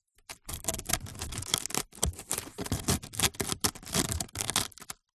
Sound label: foley string movement scrape over wood; zapsplat